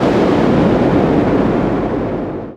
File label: NOIS; XXL 800 Production Effects